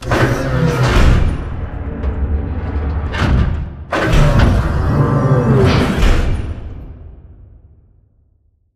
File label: Robot Arm Pass Right Pickup Close; Boomtown; Titanfall 2